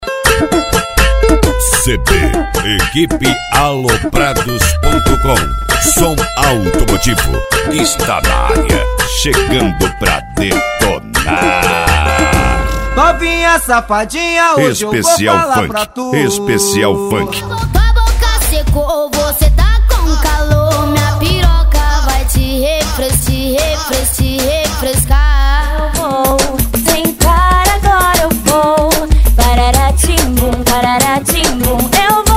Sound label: funk; equipe aloprados som altomotivo